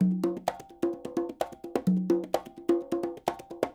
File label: FUNK 128, Percussionworks LOOPS; Sampleheads; NYC Percussionworks